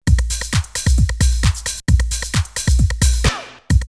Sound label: drumloops Techno House 132; Rimini; X-Static Goldmine